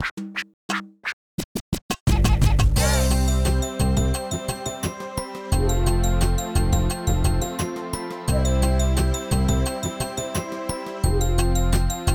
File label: STAGE SELECT ZOO BPM87; Scribblenauts